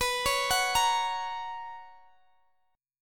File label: B Major 7th Suspended 2nd B Guitar Open G